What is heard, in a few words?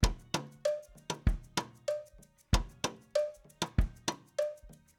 Kit Chorus